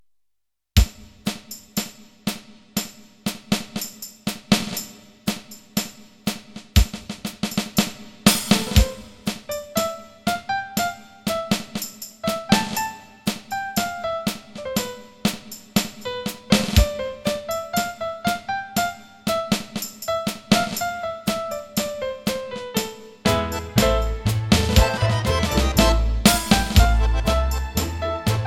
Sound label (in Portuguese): Tango (Só música)